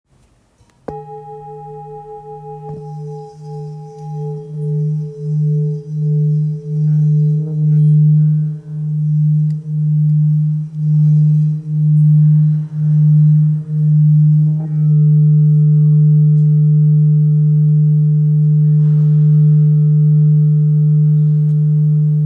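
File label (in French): Ancien ré dièse 645g, Bol Chantant Tibétain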